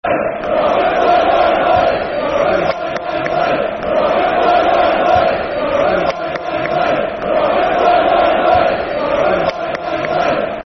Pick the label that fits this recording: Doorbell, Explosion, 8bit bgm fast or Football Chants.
Football Chants